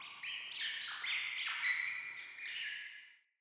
Cave Mine Rat

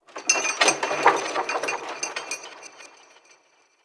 Sky Fortress Chain Move Trippy Low; Ode Sky Fortress; Tunnel

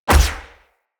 Bow Ranged Shot Punch; Weapons; Envoy Hunter Bow

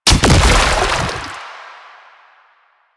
Grenade Explosion; Lasrian Chem Nox; Lasrian Soldiers; Weapons

